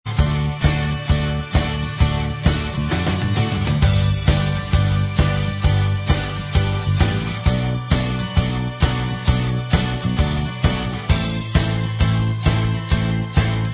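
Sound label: instrumental, backing track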